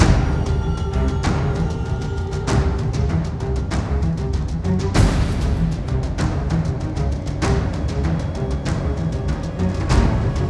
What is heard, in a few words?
loop fight shark; Moonsouls Echoes of the Past